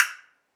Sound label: hit